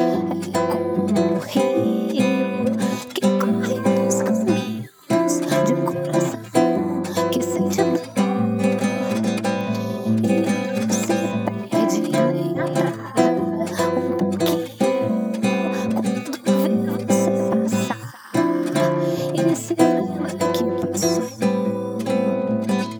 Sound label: guitar; SiSEC11